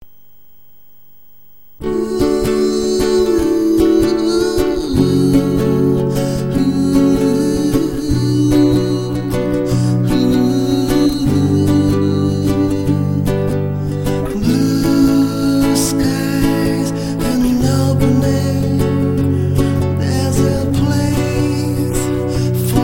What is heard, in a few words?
vodafone, ringtones